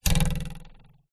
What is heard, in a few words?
cartoon bounce jump ruler twang